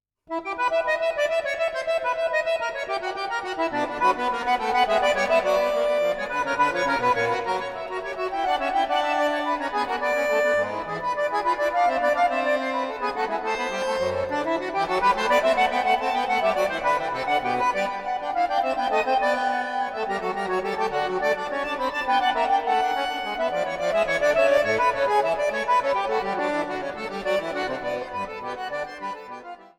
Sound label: Fugue in E minor, accordion